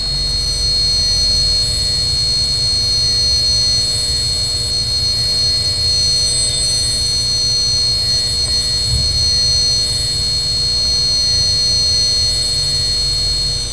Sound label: neon lights; cstrike